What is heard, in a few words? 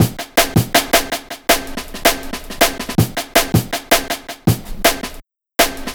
breaks, Loops; M8